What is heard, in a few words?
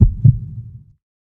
heartbeat double; soundscrate